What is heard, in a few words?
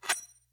axe throw cast